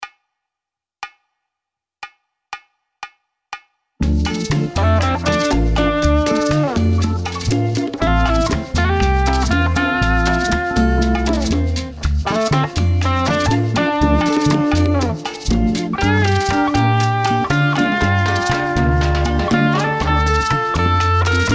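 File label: Latin Birds D minor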